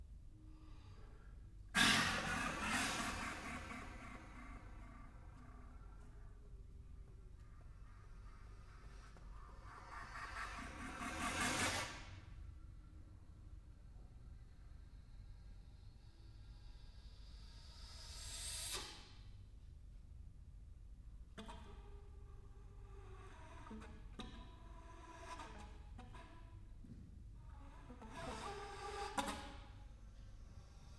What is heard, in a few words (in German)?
Alt flote; Daniel Agi; alphawaves